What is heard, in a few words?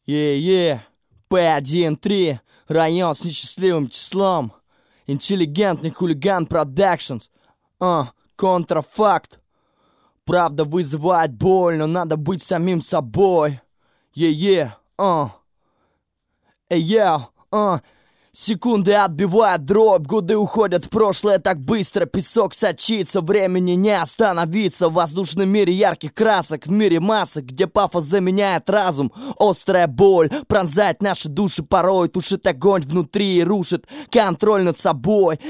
acapella